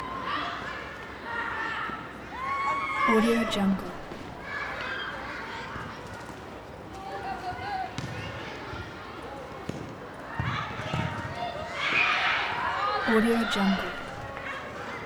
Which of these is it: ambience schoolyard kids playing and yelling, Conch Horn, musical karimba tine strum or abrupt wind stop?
ambience schoolyard kids playing and yelling